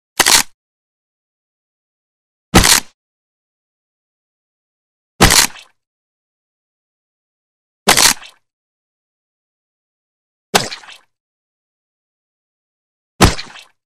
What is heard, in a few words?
Groin Crunches Short Sharp Bone Break & Groin Crunch; Hollywood Edge Foley Sound Library